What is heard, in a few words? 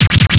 scratch